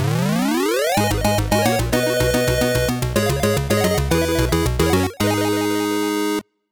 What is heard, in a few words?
Level Clear; not a hero